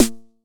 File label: Snare One Shot, Drums